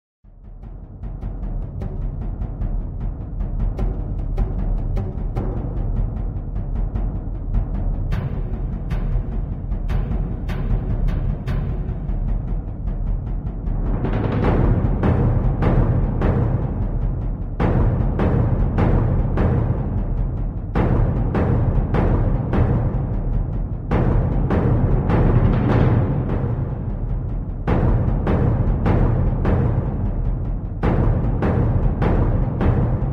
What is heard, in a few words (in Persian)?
Tabl; Vocal&Effect